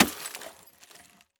Crash Concrete Dropping On Glass And Wood Debris Short; Crashes